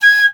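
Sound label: piccolo staccato G#5; Flutes; DirectWave; Varazdin Orchestral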